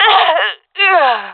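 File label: death, jungle female; vo; agents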